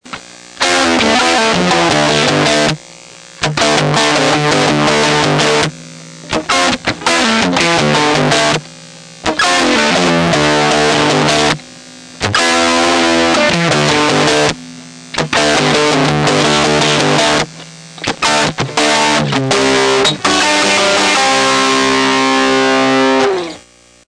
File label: Univox Squarewave, Wave: min, guitar; Proto guitar - effect - Bulldog Cab Sim - sound card